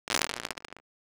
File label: combined sound, steel ball wood ground